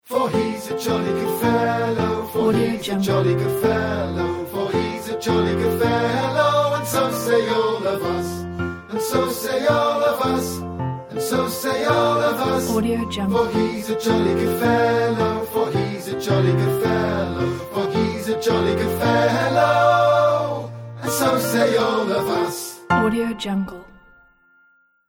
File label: for hes a jolly good fellow song